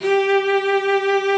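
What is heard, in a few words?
G4, cello vibrato; Xsample VOL-7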